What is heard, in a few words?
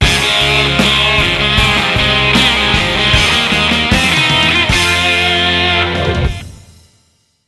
Jingle Win; Let's Fish! Hooked On; PS Vita